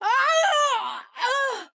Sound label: Scream; Widowmaker; overwatch